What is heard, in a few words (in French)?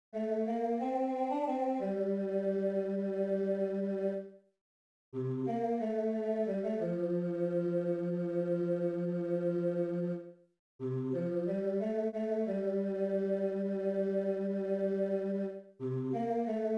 basse, Si ca vous chante; music mes 124 a la fin